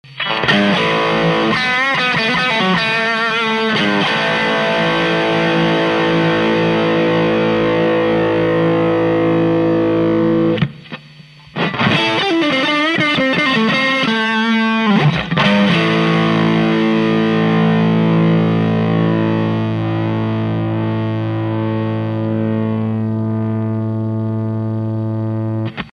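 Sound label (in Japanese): Volume Full Tone 0 Drive Full Sound, 前半はストラトキャスターのリアPU 後半はフロントPUです; OLIVE; Tone0に注目してください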